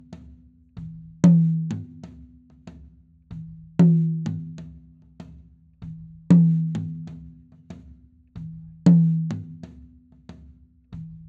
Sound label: tom I live; md 421 kompakt; mikrofonu test